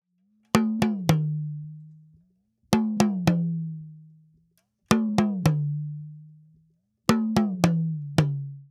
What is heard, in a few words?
Talking Drum, African